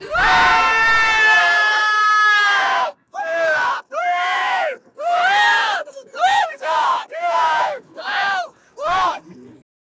village people shouting

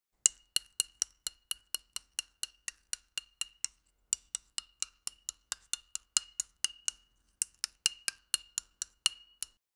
a realistic sound of slic

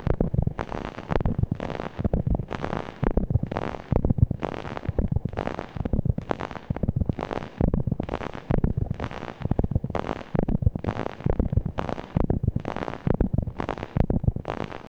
a rhytmic hypnotic loop, experimental

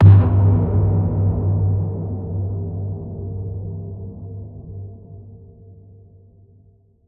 percussive sound dark

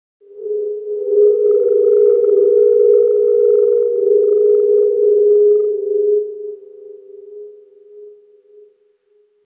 healing sound effect

